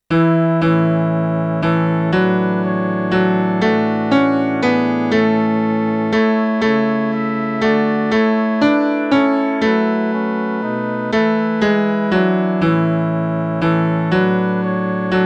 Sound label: midi